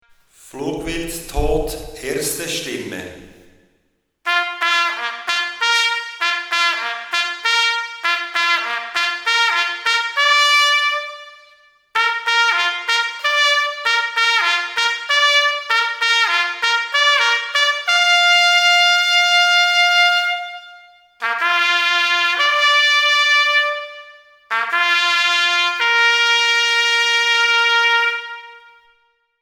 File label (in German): Flugwild tot, Jagdhorn